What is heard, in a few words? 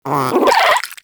Vocal Captured Idle, Hunting Critters; Nexifera